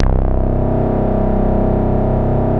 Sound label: CS-15, BASSES